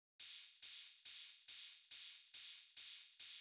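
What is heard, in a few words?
drum Loop 140BPM overhead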